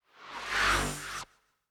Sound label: electric zoom bys singles groups